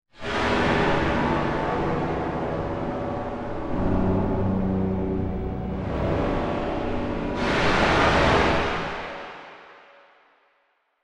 Machine SciFi Ionizer Metallic, Machine Ionizer Death Ray Airy Wash Metallic Elements; Scifi Science Fiction Horror; Battle